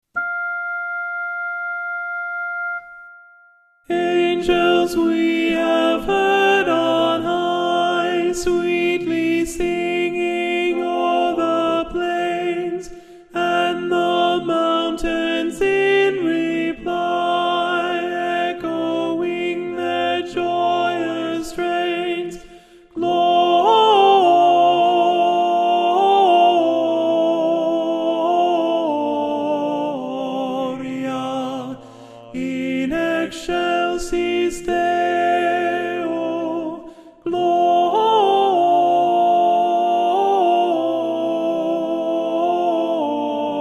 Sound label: angels alto